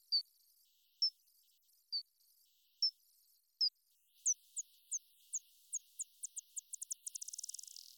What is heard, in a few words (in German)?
Nyctalus noctula Echoortung hoerbar 4x verlangsamt; Fledermaussprache